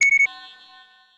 trip laser

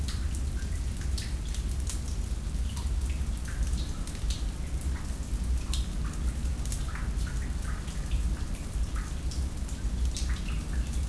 sewer drips

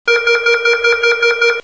alarm

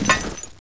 jump ladder

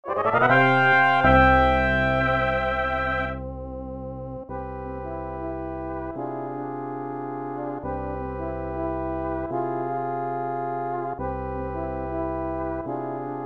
boss win; svboy

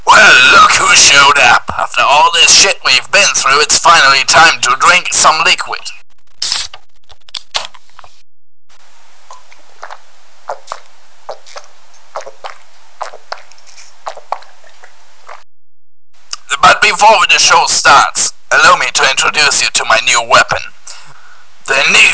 radio

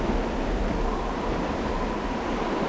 wind

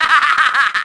hahahaha

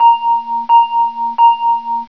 bell

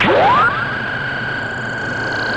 Power Blast

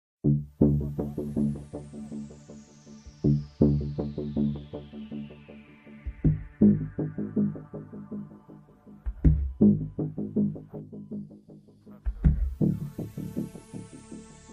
ambience infection

